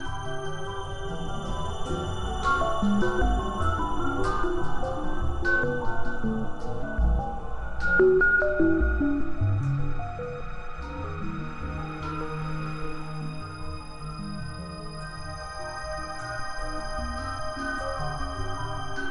sounds music; Halo Combat Evolved; Xbox

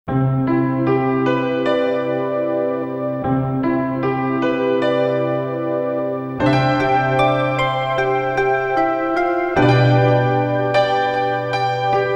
Instrumental; Akiho

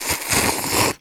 SE SUSURU

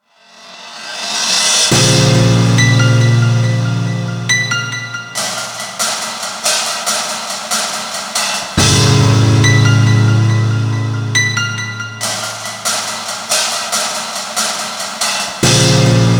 Theme Loop Stem

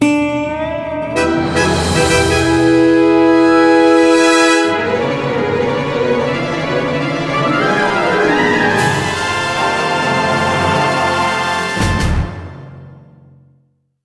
MiniGame Plants Win; DreadWood

